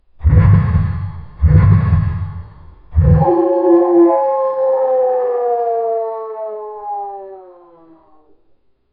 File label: very low frequency hum; amplified; SFX Engine